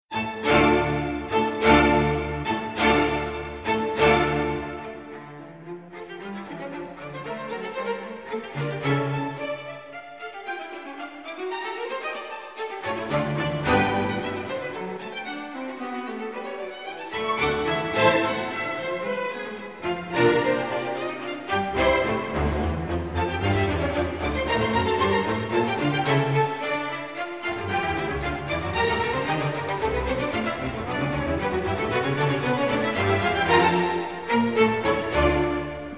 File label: Simple Symphony for string orchestra, Boisterous Bourree; Britten